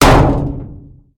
hood impact; Blind Drive

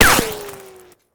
Bullet Ricochet; Sound Ideas; Guns

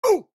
cyclers hit reaction; Blind Drive

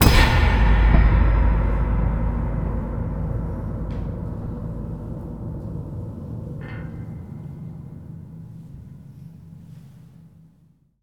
Cinematic Metal Impacts; long winter

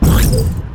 icecream shield impact sea; Blind Drive

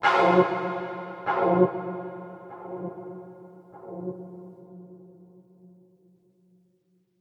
String Mystery; Inquisitor 3

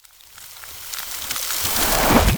Ice Spell Transformation Invisibility; Arctic Aura

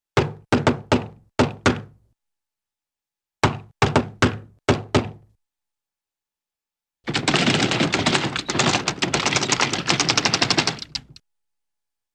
Rhythmic & Funny Knocks On Door, cartoon; Hollywood Edge; Cartoon Trax

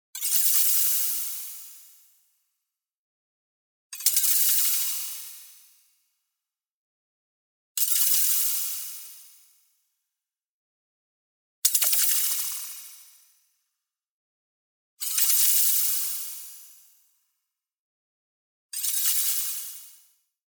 shimmer, light tonal dissolve; boom library; Anime Essentials; DSGNMisc

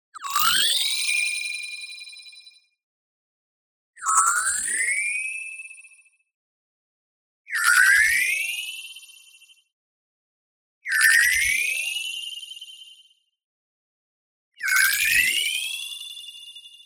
tonal, granular playful; boom library; Anime Essentials

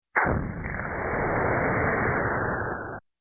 Space Space Explosion, Single Blast, Electronic; Sound Ideas 2000 Series

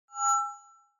Microphone Feedback - Short Classic; Hollywood Edge; sound designer's toolkit v2

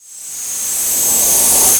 Static; Blastwave

